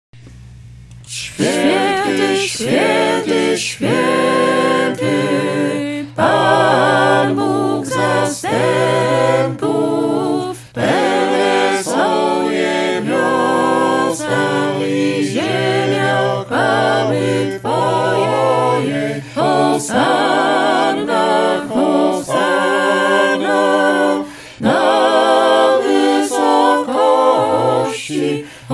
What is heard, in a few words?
satb; swiety